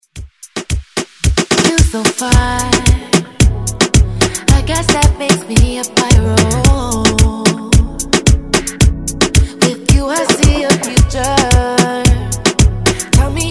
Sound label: Reggaeton Redrum